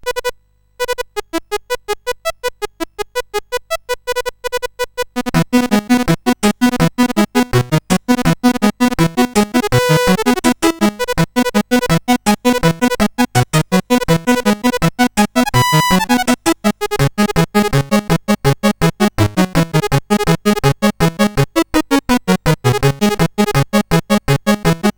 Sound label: Fireworks Celebration, CSG8580R5